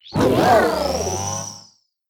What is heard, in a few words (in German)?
Ruf